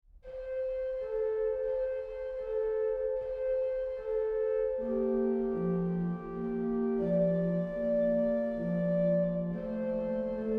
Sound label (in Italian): elevazione; 3) Terza elevazione RPS